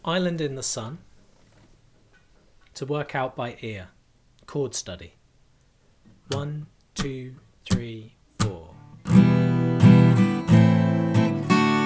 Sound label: Ear Study Open Chords